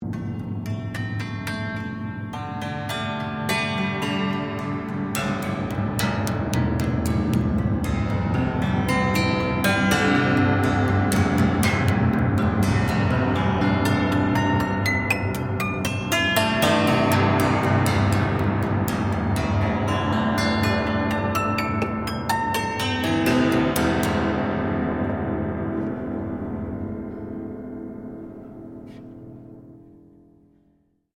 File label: PIANO UPRIGHT BROKEN RANDOM STRIKE SEQUENCE, Upright piano without keyboard; Pianos (Sound Effects:Musical)